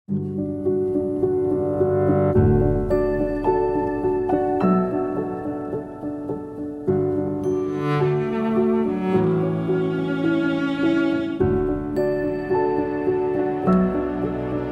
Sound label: Harmonious; Samsung; The Voyage variants